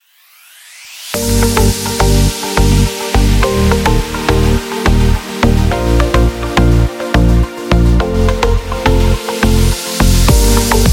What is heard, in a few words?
Chill Tropical House